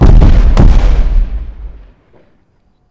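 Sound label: explosion distant kaboom; corporate; Titanfall 2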